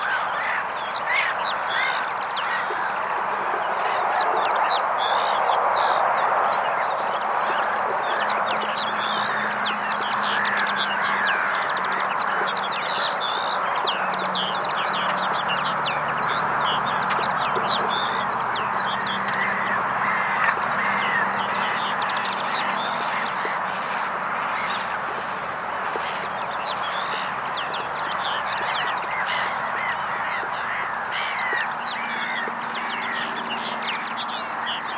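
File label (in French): linotte hirondelle rustique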